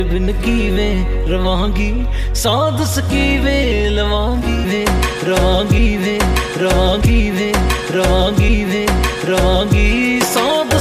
punjabi ringtone

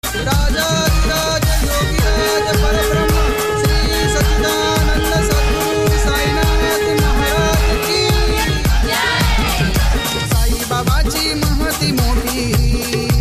sanskrit ringtone

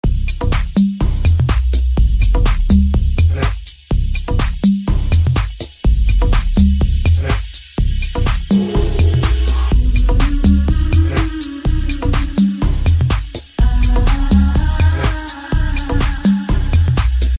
upcoming arabic ringtone

